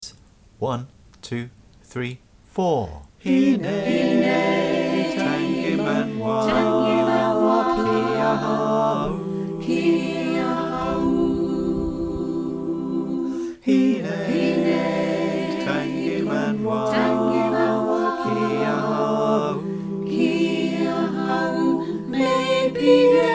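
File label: soprano alto tenor bass, choir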